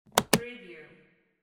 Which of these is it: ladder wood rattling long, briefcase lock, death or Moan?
briefcase lock